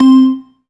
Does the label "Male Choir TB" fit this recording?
No